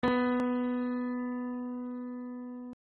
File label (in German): Ton, Klavier (einzelne) Töne